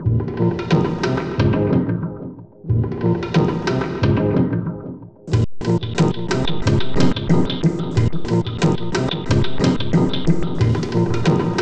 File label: Synth Sequence; Eclectic Electronica